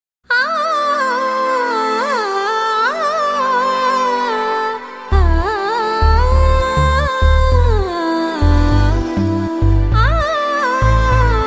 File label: humming, tamil ringtone